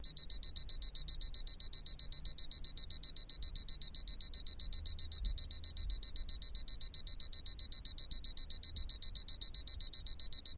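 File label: Clock Alarm Electric Be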